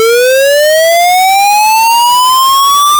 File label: square sweep 440Hz 1200Hz; fs44100; dds